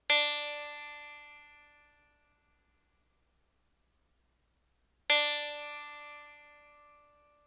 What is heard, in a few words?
plucks